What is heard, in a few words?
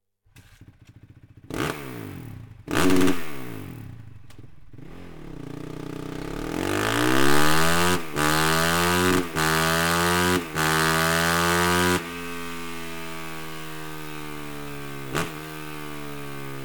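slip on with insert, exhaust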